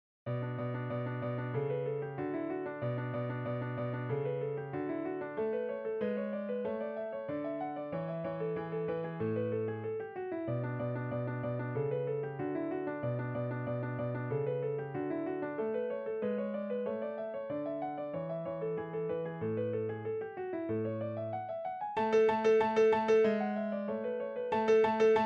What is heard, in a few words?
Anglaise Per Piano; Ludwig van Beethoven